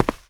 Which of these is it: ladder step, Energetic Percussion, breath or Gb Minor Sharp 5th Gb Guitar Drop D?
ladder step